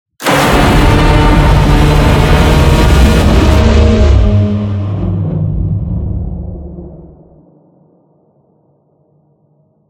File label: godzilla victory roar